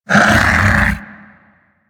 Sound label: Vocal Pain, critter; Warframe; Hunting Critters; Undazoa